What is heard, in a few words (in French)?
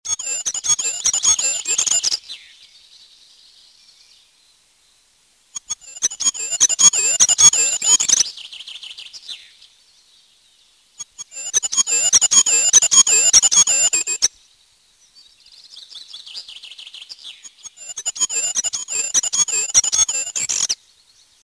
roitelet huppe